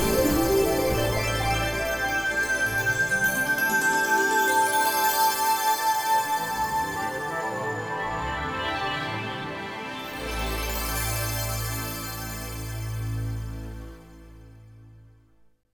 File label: Mystery Island Unlocked; Tiki Towers; android